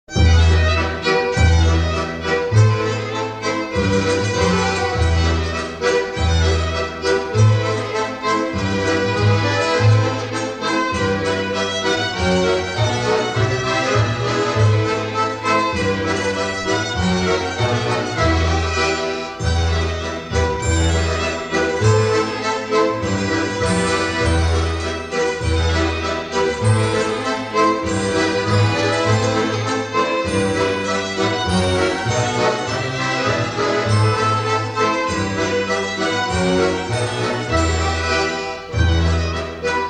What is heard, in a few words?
Karelian Folk Music